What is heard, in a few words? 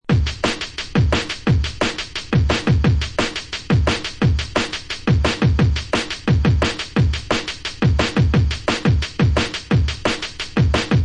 Dread Kik An Step, beats; ray keith dread jungle breaks vol 1 60 beats for dubplate clashes battle junkies